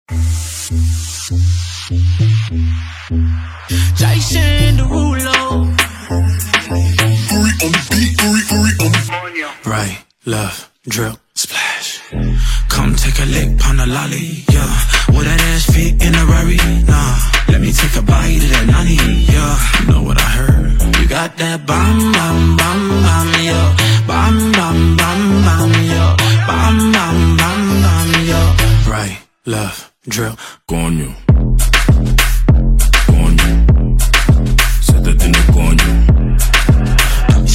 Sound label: MUSIC SOLO COMMERCIAL HIP-HOP